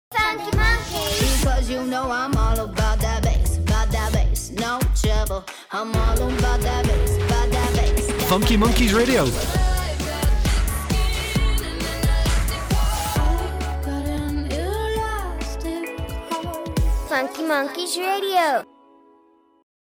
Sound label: Radio Music ID; Funky Monkeys Radio